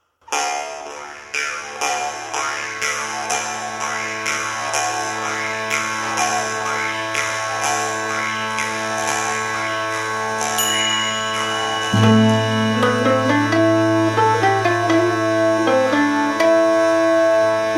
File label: Waltz Mandolin; NRB Waltz